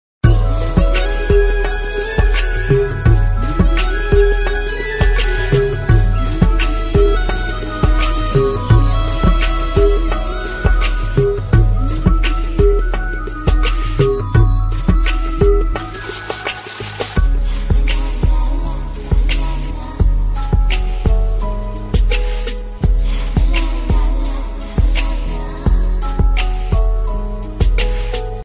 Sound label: love bgm ringtone tamil bgm ringtone; jeans